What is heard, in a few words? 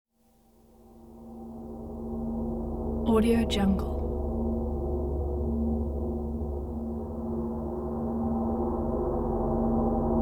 angel presence cymbal drone; prosoundfx